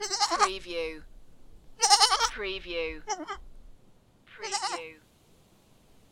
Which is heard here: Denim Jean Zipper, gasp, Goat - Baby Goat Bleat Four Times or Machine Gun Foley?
Goat - Baby Goat Bleat Four Times